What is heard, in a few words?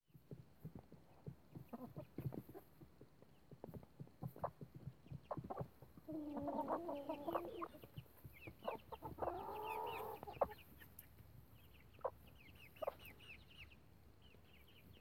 mommy clucking